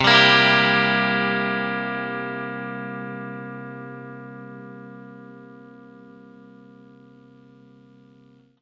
clean chord C, clean electric guitar chords

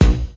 bass punch; Punches Hits Discoblasts; single hits

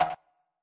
machine, Machine Electro Hits; STYLE KITS (Hip Hop, Etc)

